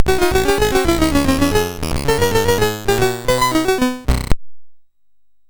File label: Fuzz, sid MOS6581R3